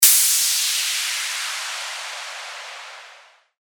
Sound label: WHOOSH